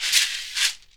SHEKERE; ILIO; Synclavier Percussion 2; World & Orchestral

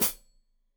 Hat Foot, Hi-Hat, Cymbals Stereo

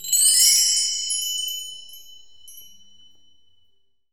FR BELL TRE, PERCUSSION; AKAI S6000 CD-ROM - Volume 5; GM PERCUSSION KIT

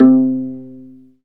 STR VLA PI, Chamber Pizz, String Master Series; Roland - String Master Series

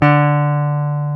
Jazz Guitar; Club-50 - Foundations; Jaz-Kessel

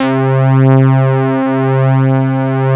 SYNTHEX, SYNTH; East West - Global Sounds; CEE SYNTH

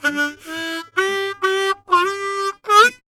Harm 129bpm G, Harmonica

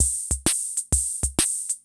CR78

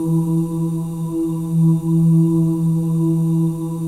BGV OOS; Spectrasonics; Symphony of Voices

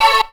chord, stabs

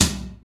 TOM, Kit s; Real-Kit s

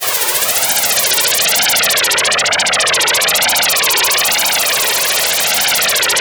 STAR GLYDER; Best Service Dream Experience; SF+EFFECTS

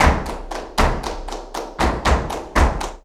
BULERIAS TAP, Flamenco

